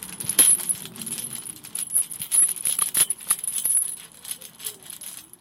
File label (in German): kettenrasseln